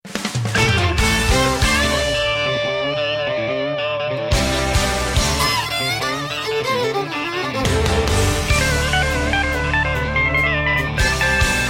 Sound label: country ramp